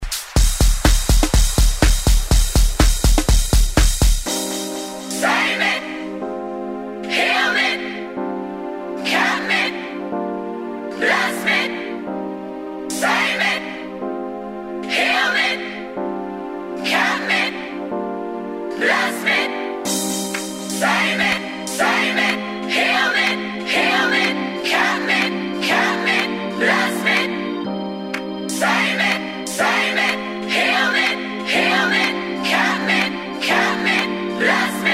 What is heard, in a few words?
acoustic version